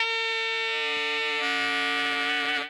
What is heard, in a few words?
sax, Hip Hop and R&B Drumloops; Best Service ProSamples vol.01; AKAI